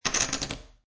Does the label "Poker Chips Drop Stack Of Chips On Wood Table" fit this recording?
Yes